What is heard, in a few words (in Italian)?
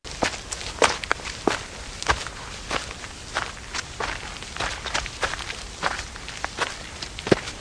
passi in campagna; Tono di notifica; per Cellulari Android o Iphone